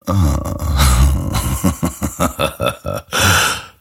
grimstroke laugh; dota

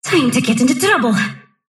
sylph move pain; dota; dark willow

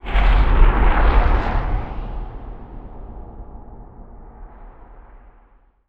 solar flare target, weapons; dota; mirana

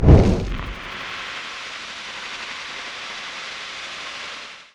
searing chains; weapons; dota; ember spirit

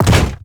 metal heavy equip, inventory

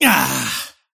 parry fail; dota; kez

